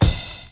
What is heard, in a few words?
drum chop; AMG Gota Yashiki Groove Activator